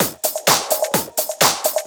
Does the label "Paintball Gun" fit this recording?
No